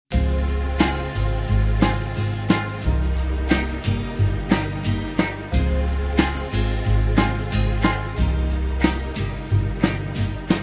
(Ab) No Backing Vocals

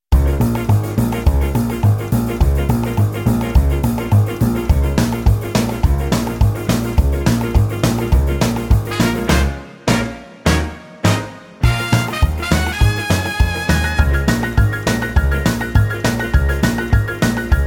Db No Backing Vocals, backing tracks

